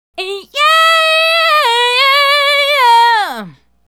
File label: Female Solo, Vocal